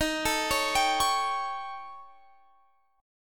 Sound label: Eb 7th Flat 5th, Eb, Guitar, Drop D; x,18,19,18,20,21